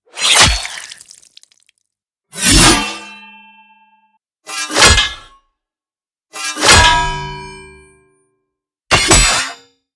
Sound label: impact